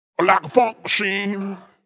Vocal Deep Like A Funk Machine 110, Funk vocal loop sample